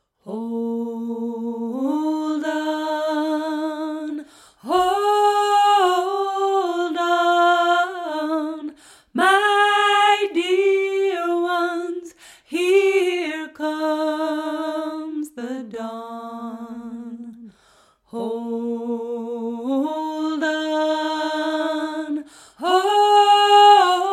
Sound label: Melody